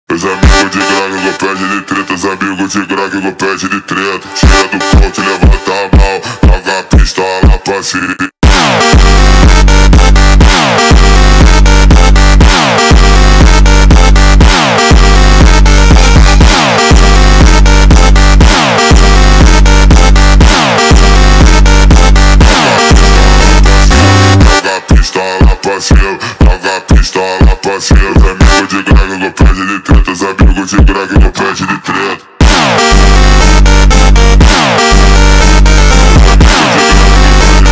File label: funk do balkan